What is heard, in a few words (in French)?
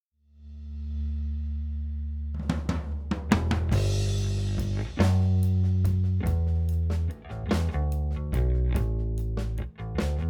drums bass sans